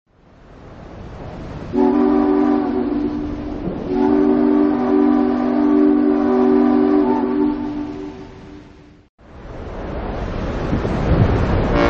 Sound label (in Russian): bolshoj korabl voennij korabl krejser svistok